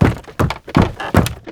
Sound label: Raptor Plank